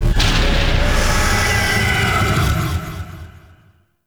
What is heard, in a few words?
UI Void Angel; Warframe; Missions; Zariman; Zariman Assassinate